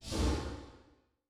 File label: space dragon pulse; Stellaris; guardians